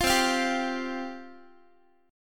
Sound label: C Suspended 4th C Guitar; Csus4 strummed; 20,20,20,17,x,x